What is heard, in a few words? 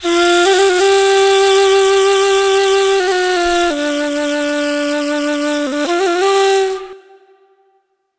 Ney, Wind Instruments